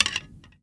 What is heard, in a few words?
Arrow Nock; timelock vr